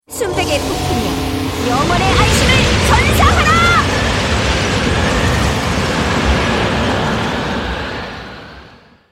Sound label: Blizzard Storm